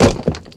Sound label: Stone Bounce